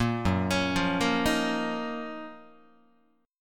Gb Major 13th Gb down Guitar; GbM13 arpeggiated; JGuitar

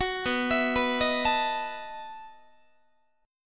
B 11th Guitar; arpeggiated

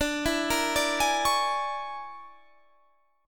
Bb 7th Sharp 9th Flat 5th D

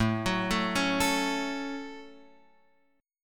A 6th, Guitar, Open F; arpeggiated

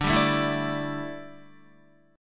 D Suspended 2nd Suspended 4th D down Guitar

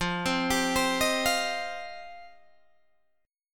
F 7th Suspended 2nd F Guitar Open D

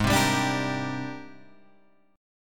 G sharp Major Sharp 11th, Guitar Open G

